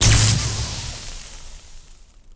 conduit slash; impacts explos

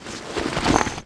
banner unfurl; school; Tony Hawk's Project 8; XBOX; inschool

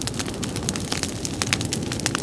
TORCLOOP

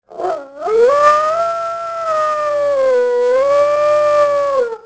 were howl; The Elder Scrolls III Morrowind GOTY; XBOX

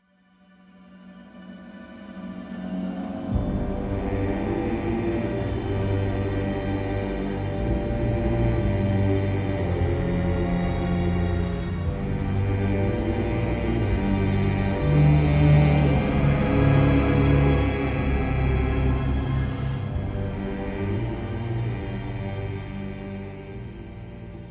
tomb, music flourish

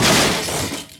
crush, Impact

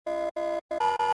fuelpump

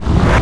bullet zoom out; Tony Hawk's Project 8; XBOX

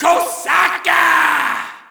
Shout, audience; UFC Tapout 2

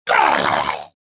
choke

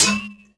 hit metal; impacts explos; cortosis

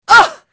woman hit, duel; Pirates Of The Caribbean

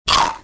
bite; mine

